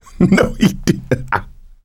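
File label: laughter, male; survivor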